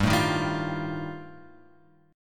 G 7th Suspended 2nd Sharp 5th G; G7sus2#5 Chord {3 0 1 0 X 1} strummed

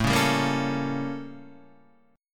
A 7th Flat 9th Guitar Open D; 7,4,5,4,4,7

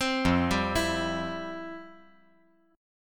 F Major 7th Suspended 2nd, F, down, guitar

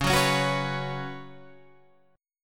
F sharp Suspended 4th, C sharp, guitar chord

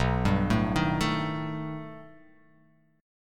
B Augmented 7th B down; arpeggiated; JGuitar

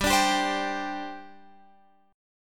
G Suspended 4th, Guitar, Open F; Gsus4 strummed; x,14,14,14,15,14

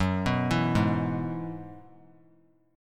F sharp 7th Suspended 2nd Suspended 4th; F#7sus2sus4 arpeggiated

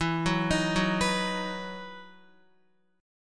E Major 7th Suspended 2nd, Guitar

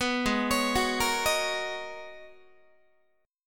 G sharp Minor 11th G sharp Guitar, chord; G#m11 arpeggiated; JGuitar

